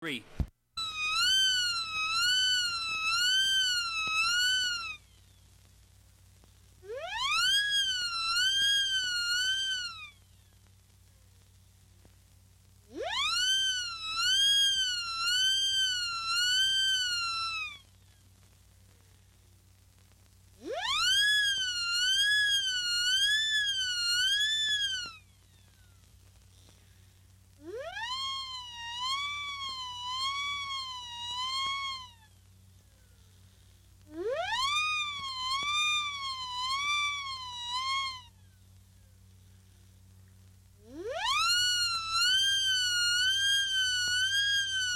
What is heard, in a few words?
whoopee whistle siren